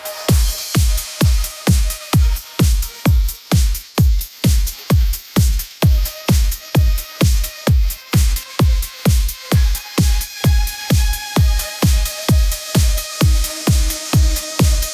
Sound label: dancefloor; ai-generated